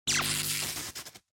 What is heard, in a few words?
Electric Shock Short